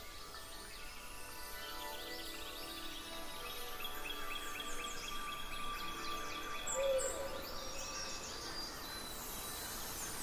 ambience General; fairyred

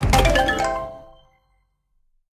explode quick stop few; serengetikings

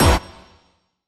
reelTwinQuickStop; twin spin

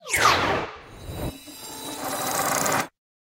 free spin outro close; goldentavern

